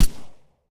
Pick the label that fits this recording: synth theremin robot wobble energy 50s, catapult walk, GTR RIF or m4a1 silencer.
m4a1 silencer